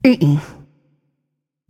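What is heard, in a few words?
nonverbal disagree; smite; voicepack; izanami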